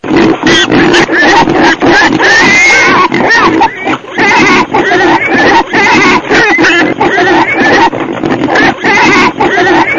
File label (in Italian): CINGHIALE PASTURA